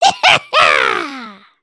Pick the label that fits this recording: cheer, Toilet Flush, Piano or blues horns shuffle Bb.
cheer